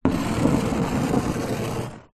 foley stone scrape wooden surface; zapsplat

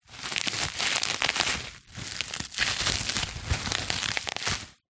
bubble wrap crumple handling; foley

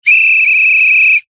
sports whistle soccer burst

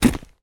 footstep dry forest floor; foley

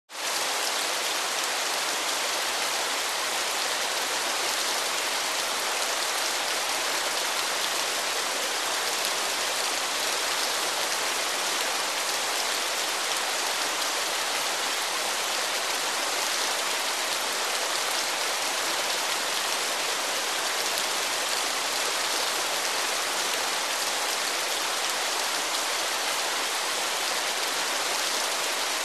Waterfall Small Bg Sp; Audio Hero

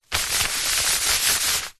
wrapping paper rub smooth out with hand

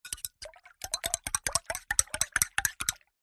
spoon stir hot water tea or coffee in mug

